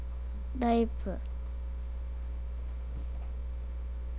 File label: knife